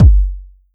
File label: Kick, 909; Slate; Vintage Drum Machines Volume 3 909 Edition